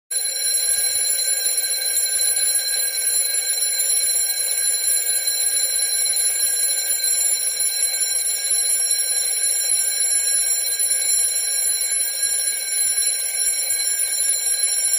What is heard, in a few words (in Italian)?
suoneria sveglia